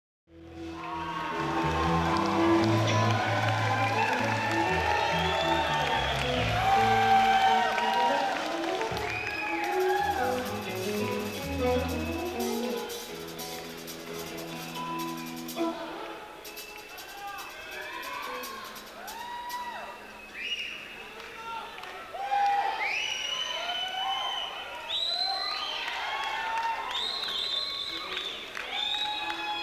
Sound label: Crowd Tuning; senn421